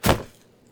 Jumping On Grass

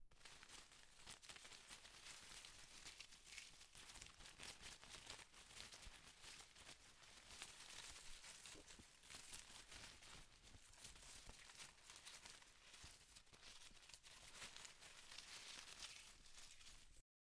Rustling Of Leaves

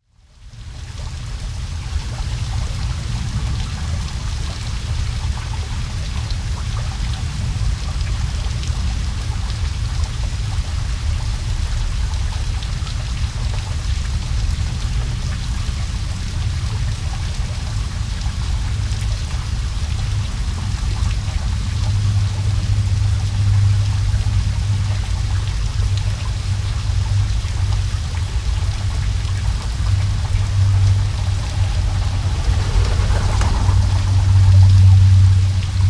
Downfall No Wind

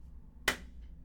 Face Palm

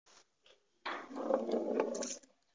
Ball Rolling

